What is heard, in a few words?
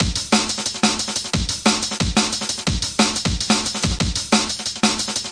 180 crunchy snare tripper, drum loops and breaks; 161 to 180 bpm